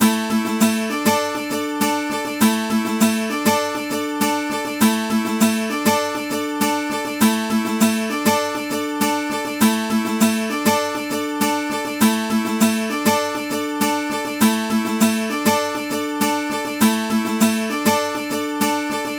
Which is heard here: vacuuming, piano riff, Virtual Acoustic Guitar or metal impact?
Virtual Acoustic Guitar